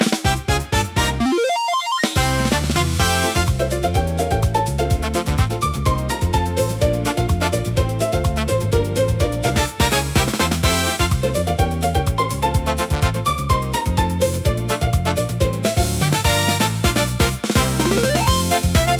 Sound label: rpg market stock exchange; tillys-quest; investing-dlc